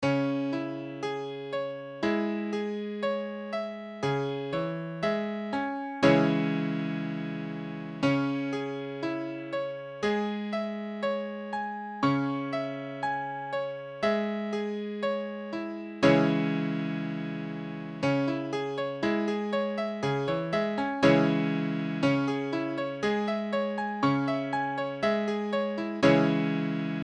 Dbm, piano

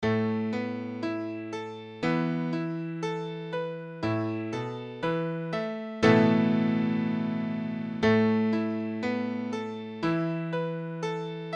A sus2, piano

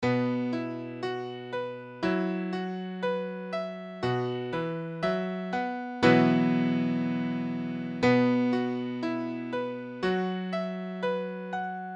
B sus4, piano

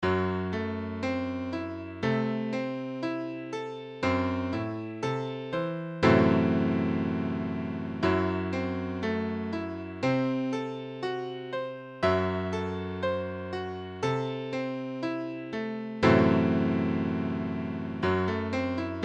Gbm7 5b, piano

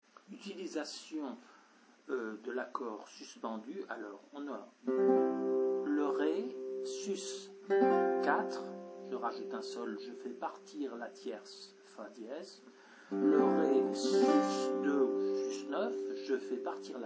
sus4 sus2, guitare

